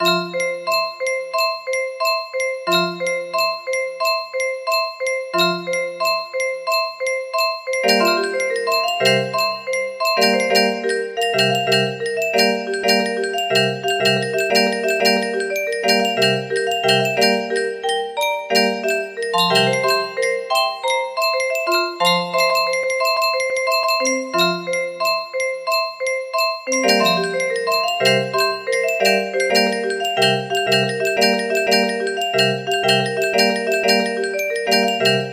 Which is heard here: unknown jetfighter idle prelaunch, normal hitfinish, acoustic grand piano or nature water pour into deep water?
acoustic grand piano